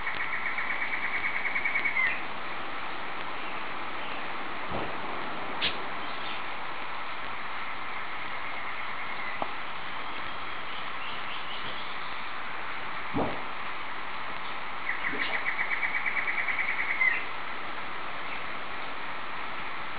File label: drake bay jungle; the songs of birds; costarica; the path behind the resort that snakes through the forest